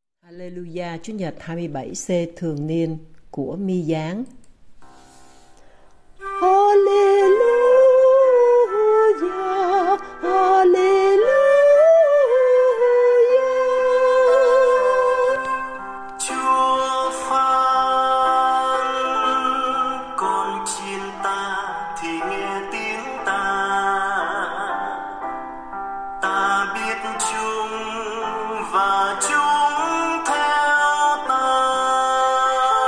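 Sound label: Alleluja Soprano; ca doan teresa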